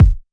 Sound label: hot kickdrum f key, Loudest frequency: 136Hz; kick drum samples